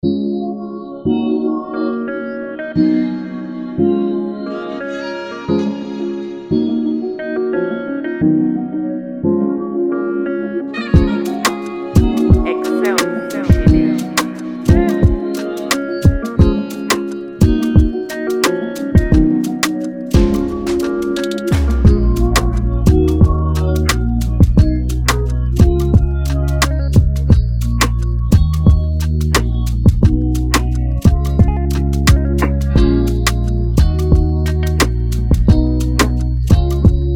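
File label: Afrobeat instrumental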